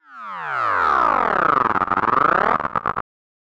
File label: Textures; 99Sounds; The Weird Side Samples